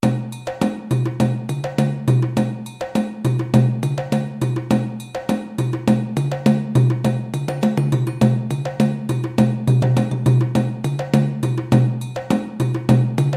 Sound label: Balakulandyan Groove 205 bpm, djembe